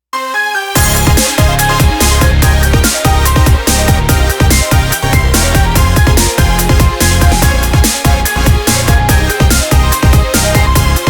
instrumental

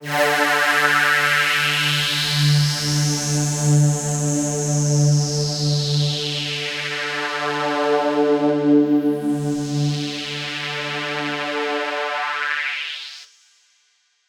Pad, PsyTrance

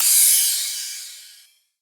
Cymbal, PsyTrance

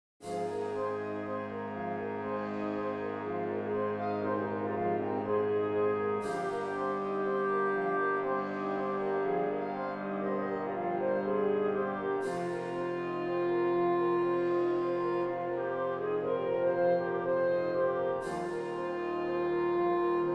Wind Orchestra

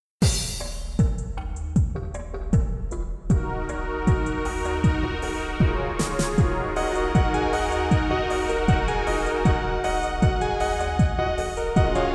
Ambient ver.

